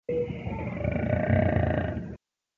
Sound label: burp